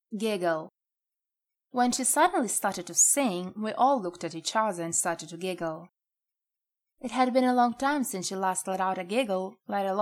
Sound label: giggle